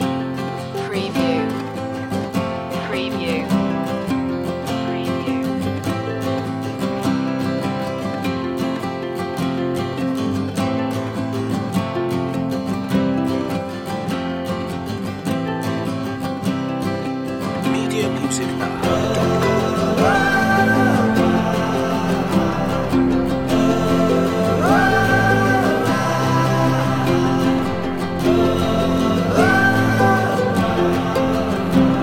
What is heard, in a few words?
the nu-folk tune